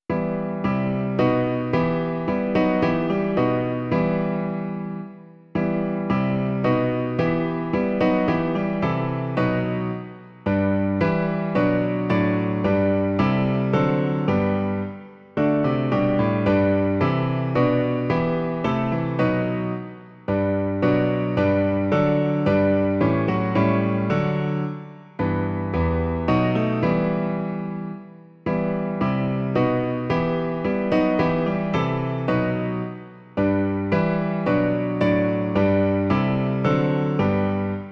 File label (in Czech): Chvála Bohu na výsosti - klavír; Klavírní verze